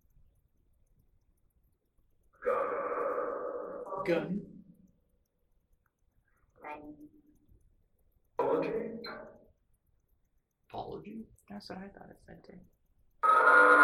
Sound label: Hall Phasma; wexford general store antiques investigation